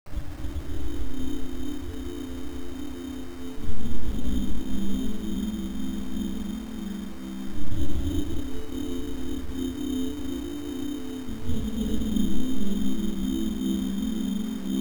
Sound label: wavesynth